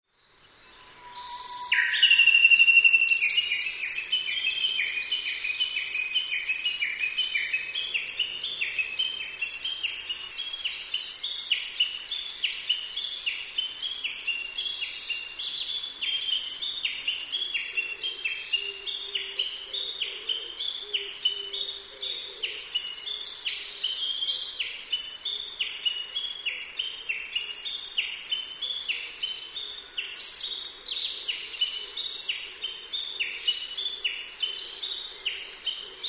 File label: uguisu; ウグイス; さえずり